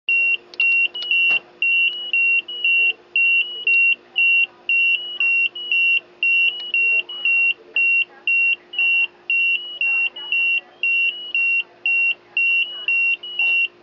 ICU Alarm Background, hospital

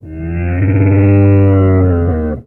Camel Groan Single; camels

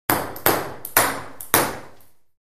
Ping Pong Ball Bounc

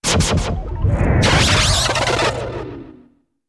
Heavy Caliber Lazer, zaps

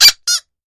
Rubber Toy Squeaks, toy squeak

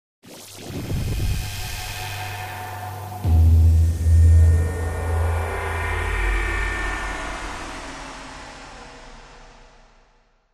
Wash Elect Ripple

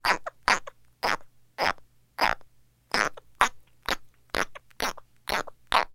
Frog Australian Tree

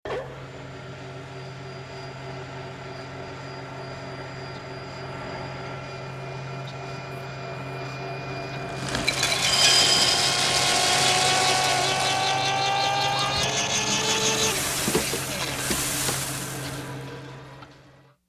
Coffee Grinder Switc, appliances

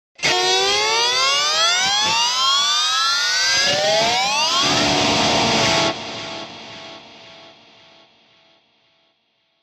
Elect Guitar Scream, electric guitar

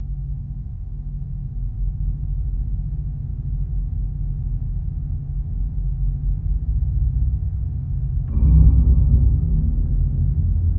Space Ambience Mono Loop, sci-fi ambience

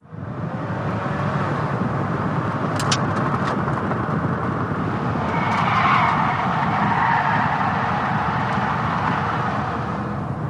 Sedan Accel Corner

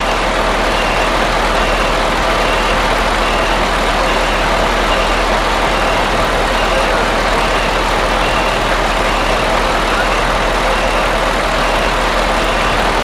Generator Constant

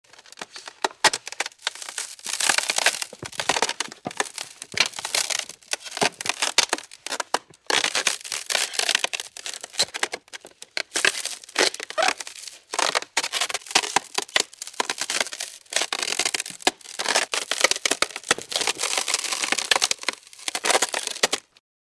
Ice Cracking Squeali

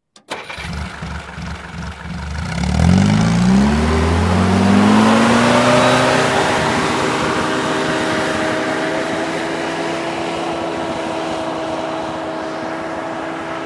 Army Jeep Start Idle S

